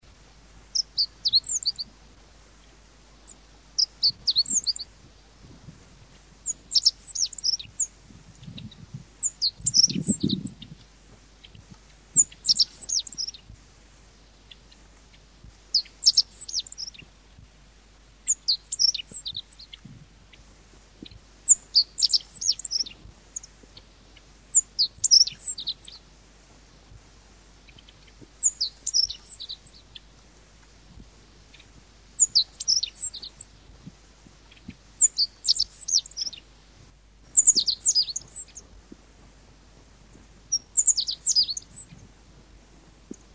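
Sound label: Emberiza godlewskii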